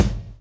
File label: GRV, Groove; AMG Gota Yashiki Groove Activator